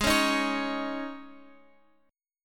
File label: G sharp Suspended 4th G sharp, Guitar Open F; strummed